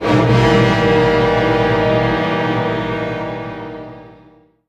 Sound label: Drama builds